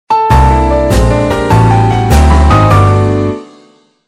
Jazz

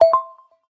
Key Call; LG Wine Smart F480K

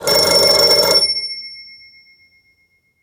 classic electric, ringtones; ZTE Open C

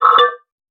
zip, notifications; Nothing Phone (2)

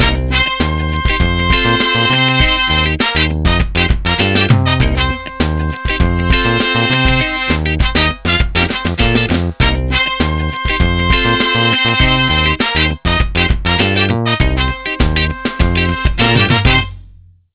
Funky; 9210 CD

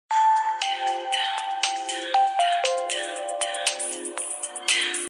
AudioTest Left; Samsung SCH-M710 T Omnia II

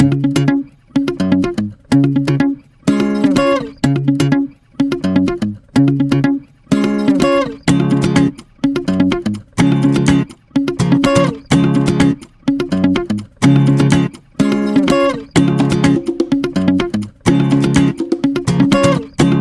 Joyful Together, ringtones; LG Wing 5G Verizon

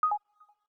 Cancel Loud; Samsung SCH-M710 T Omnia II

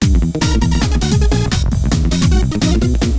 Electro, ringtones; Google; NewWave Labs

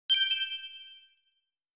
Precious Phone; Windows Phone apps; DeviceHub Gadgets 4.4

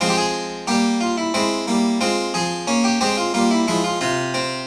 Classical, ringtones; Google; NewWave Labs

